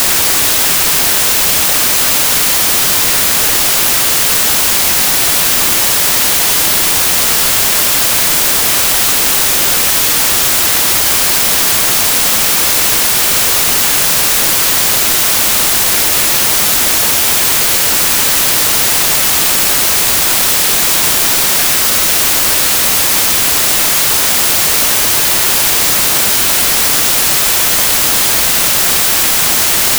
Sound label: white noise